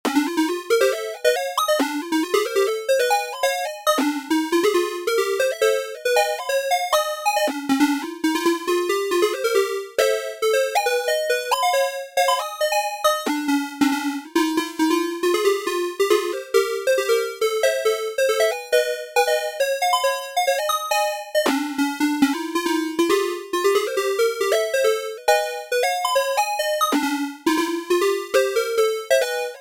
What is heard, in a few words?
like a marimba, synth; analogsynth